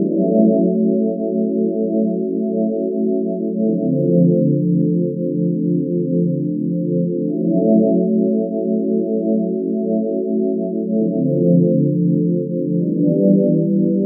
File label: Pad 131 BPM F#, Club Techno; Zero-G; Groove Loops